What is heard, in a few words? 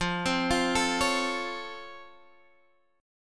C sharp Augmented Major 7th F, guitar chord